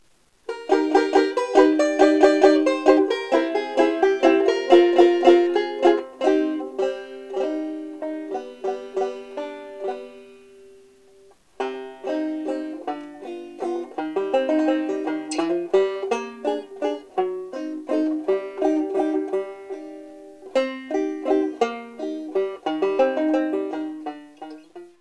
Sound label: Valse; Valse Sympathie